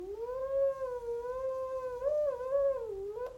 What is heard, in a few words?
theremin ultrasonic